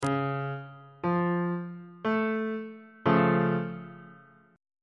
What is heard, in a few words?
e sharp augmented 2nd inversion on bass clef